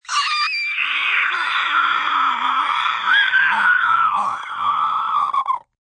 Aliens Screech, Alien, high-pitched, bird-like, screatching (human voice)